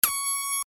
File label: alarm